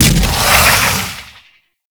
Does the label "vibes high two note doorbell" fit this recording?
No